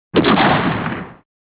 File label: Gun, Rifle